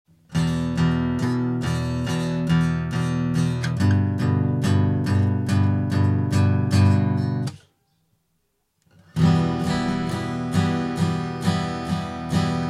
strumming, guitar

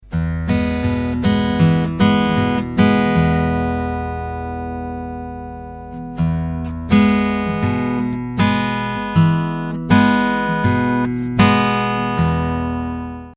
Thumb first finger 2nd exercise, guitar

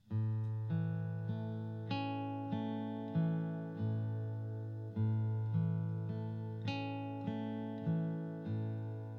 A Major Arp, guitar

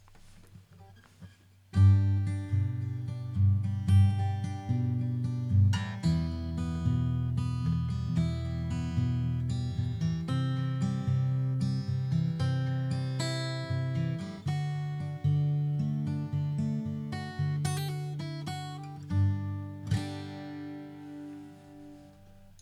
G EM C D PICKED

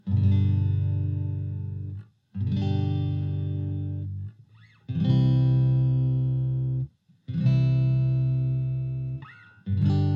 G to C bass and guitar

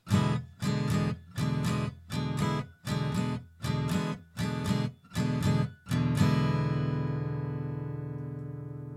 Shuffle Blues Strum

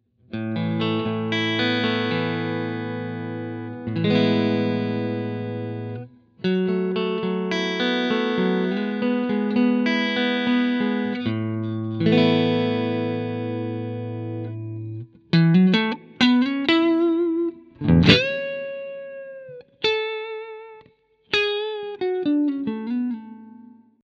Clean Tone, guitar

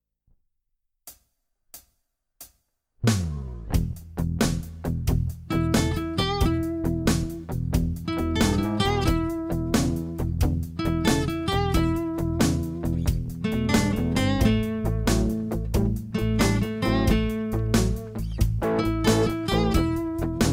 Lick around the fret board

